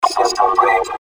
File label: system break